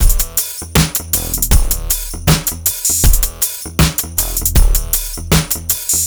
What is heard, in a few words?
HipHop Combo Emin; Digidesign; Pro Tools Essential Loops